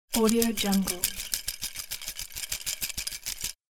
plastic sticks rustling; domestic sounds

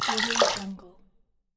small water splash; nature sounds; صدای پاشیدن آب

